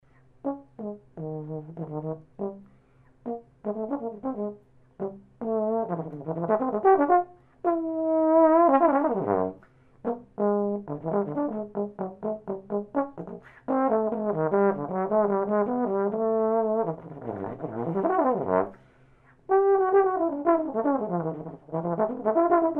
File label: fast, solo trombone